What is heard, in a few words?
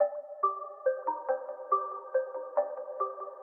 keys